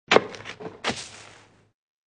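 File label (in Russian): Звук гильотины быстрый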